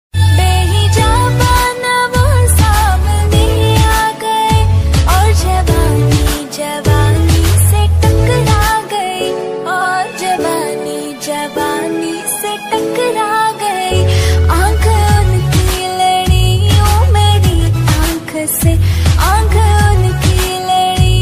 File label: Female Version